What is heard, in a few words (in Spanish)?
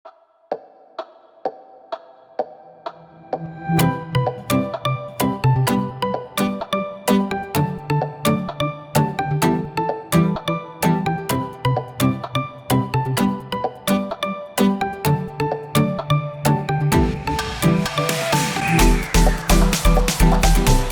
musica electronica de fondo